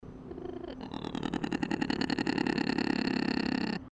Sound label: Varecia growl, lemur; long growl; The Audible Phylogeny of Lemurs; Eulemur grunts